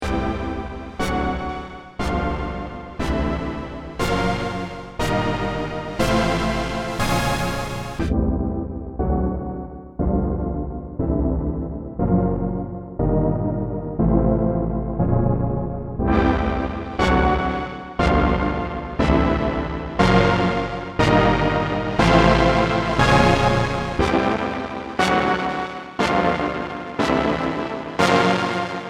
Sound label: Distortion